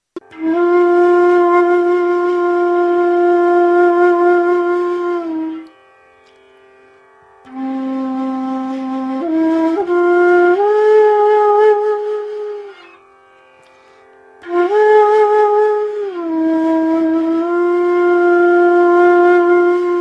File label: bansuri; instruments